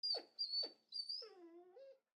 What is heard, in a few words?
Dog Sad